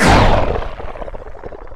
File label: death; player